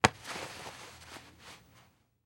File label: Household Belt Grab And Shift